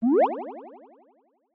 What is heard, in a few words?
Motion Pop